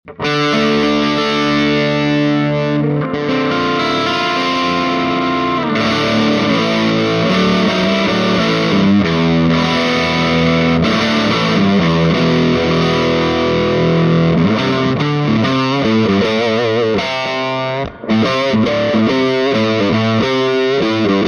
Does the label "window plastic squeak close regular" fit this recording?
No